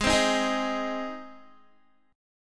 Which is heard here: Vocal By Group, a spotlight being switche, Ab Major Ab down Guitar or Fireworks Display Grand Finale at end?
Ab Major Ab down Guitar